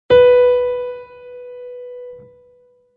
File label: instruments piano si; maoudamashii